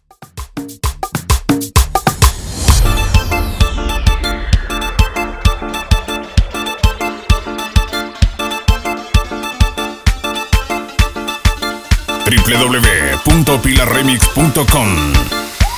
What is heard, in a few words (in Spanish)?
Extended Tribal to Albazo, Bpm 130